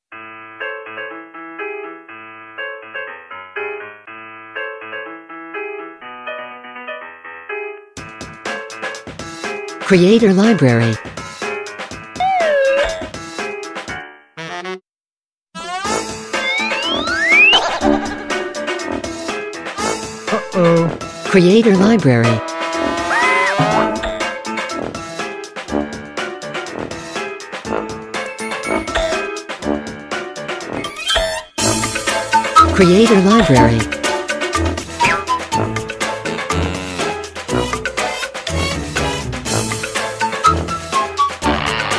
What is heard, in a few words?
Cute Bugs, Children